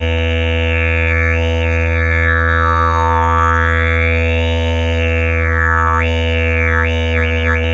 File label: E drone simple, Didgeridoo; Big Fish Audio; Didgeridoo and Other Primitive Instruments